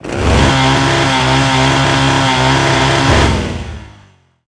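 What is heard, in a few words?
Weapon chainsaw loop mono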